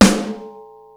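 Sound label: snare rock open, Yamaha Motif ES drum machine; 300 Drum Machines; Yamaha Motif ES-Series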